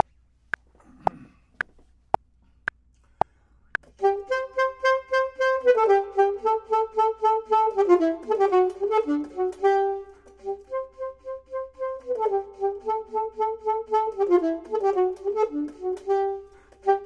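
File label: top, Saxophone and Clarinet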